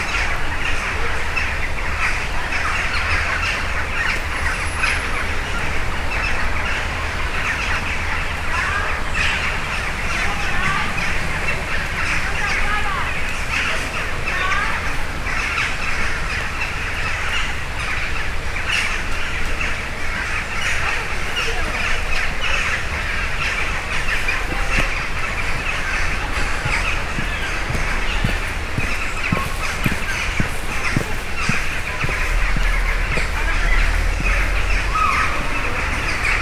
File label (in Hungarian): ezercsóka bakancsban elmegy valaki